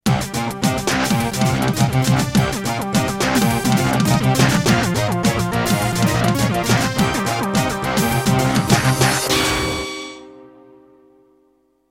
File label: Jingle